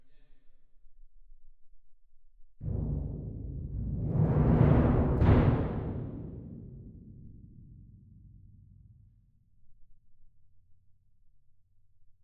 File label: Perc